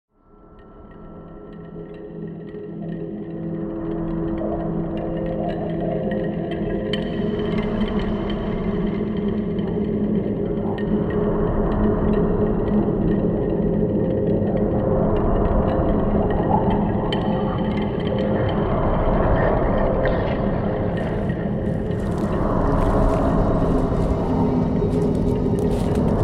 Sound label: graveyard Scary Forest